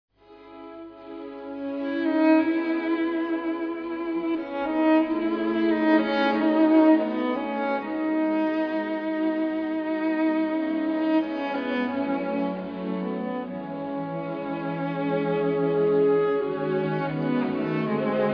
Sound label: cello moments; Klassisk cello CD